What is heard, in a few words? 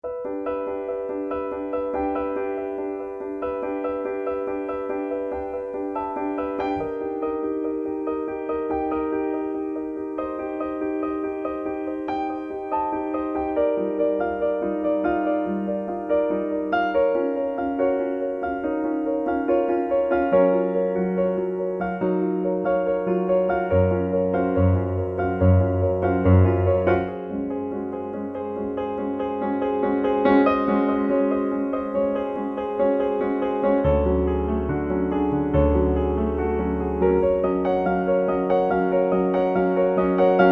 Piano